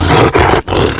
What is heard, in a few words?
were alert, werewolf